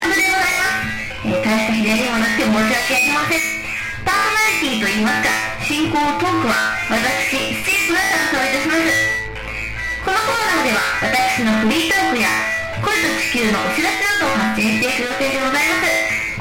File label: old game